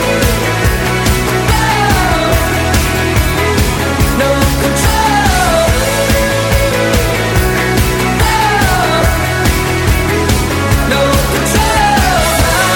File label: english music